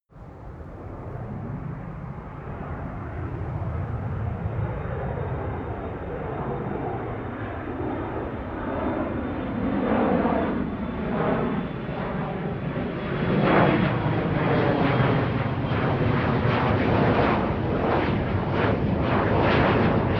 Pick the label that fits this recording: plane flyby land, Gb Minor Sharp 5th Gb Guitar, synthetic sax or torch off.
plane flyby land